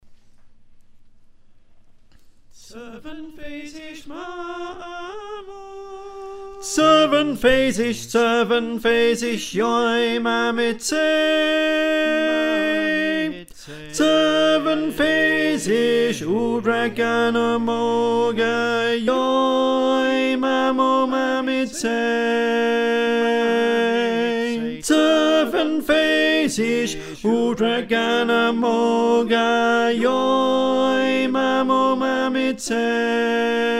Tenor, choir

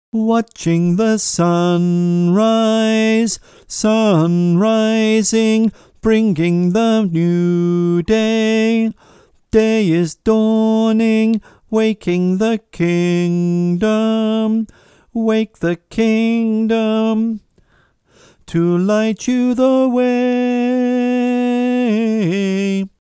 bas

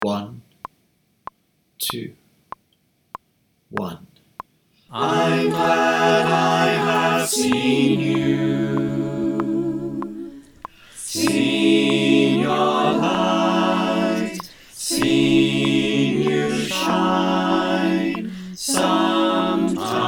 Bass w click

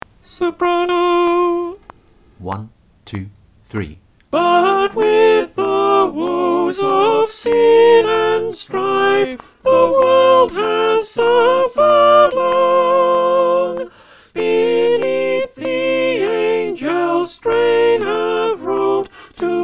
soprano click; It Came Upon

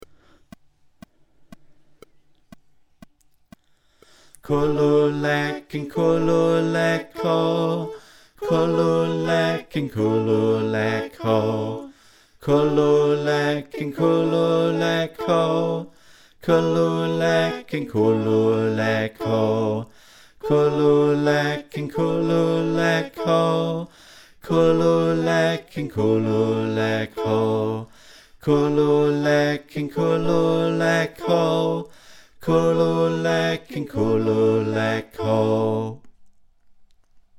Backing Chant Bas

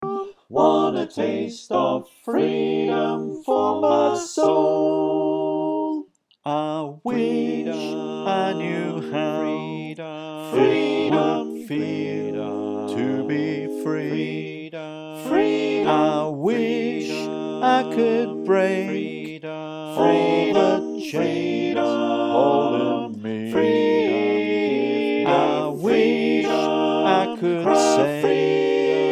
SATB & Lead

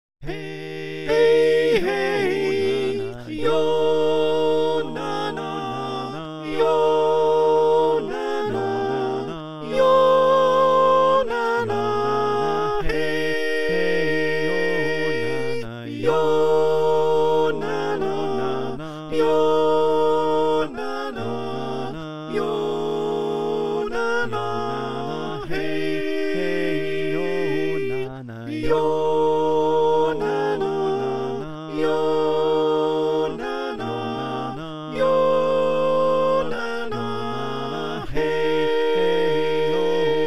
Soprano

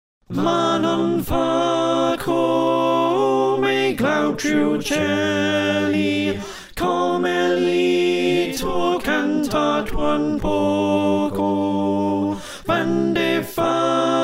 Slow Alto

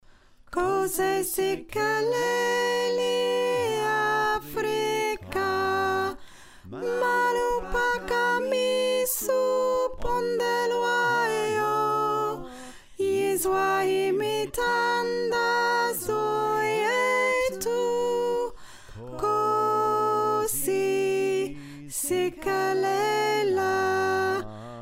Soprano, choir

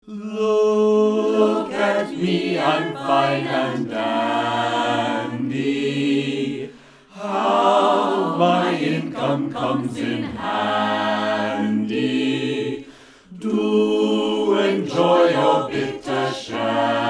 SATB, choir

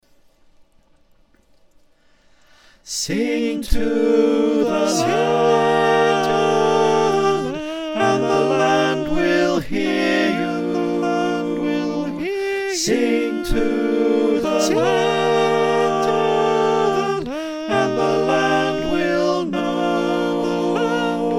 SATB

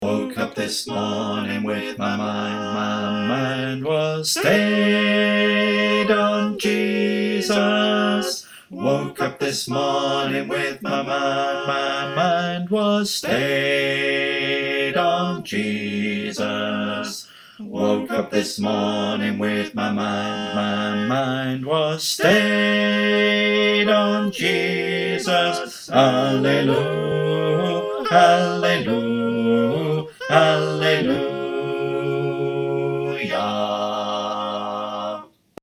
Bas

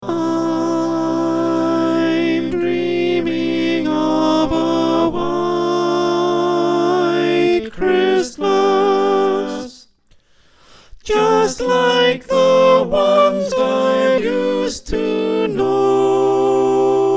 soprano

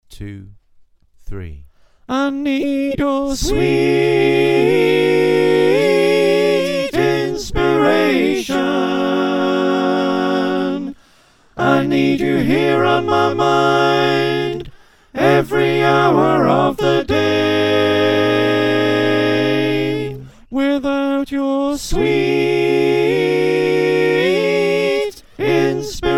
SATB

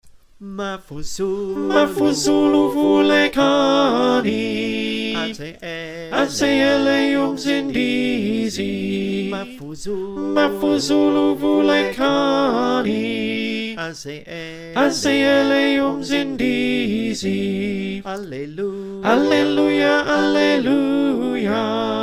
Tenor, choir

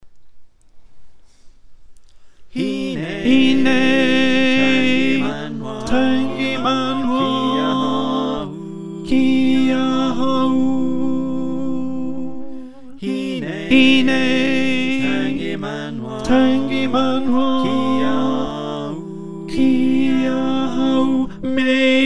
Alto

